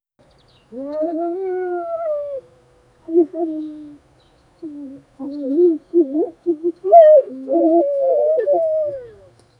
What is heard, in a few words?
a few prehistoric neander